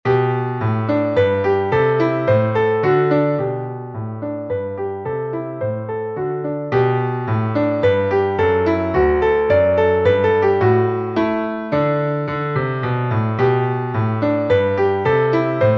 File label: menuet major; Wilhelm Friedemann Bach